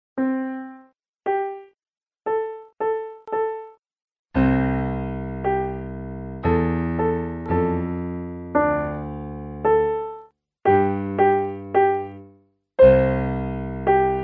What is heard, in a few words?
sine mixdown